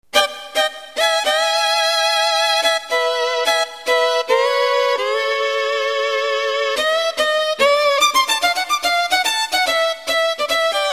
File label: Fiddle